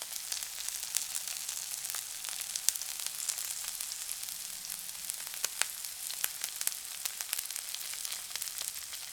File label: cooking